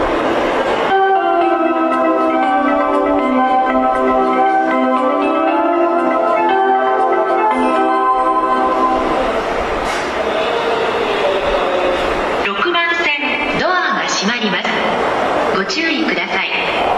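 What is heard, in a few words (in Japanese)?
発車メロディ, 大崎駅, 湘南新宿ライン・りんかい線; 新宿・新木場方面; 埼京線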